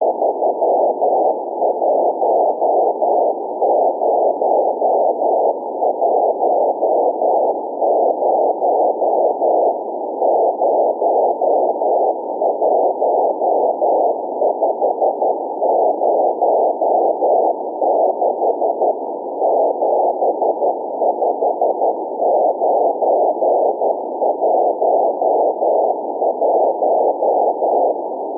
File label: aurora cw 0db bw600